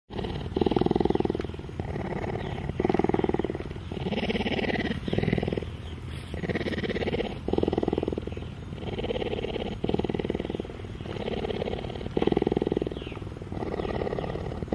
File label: Purring cheetah